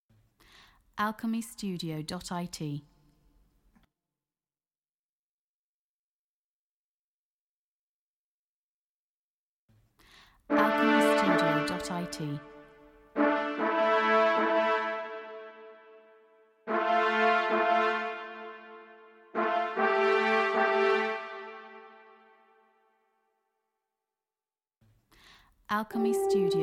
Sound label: The epic trailer Lead Brass; DarkTonic; MasterAudio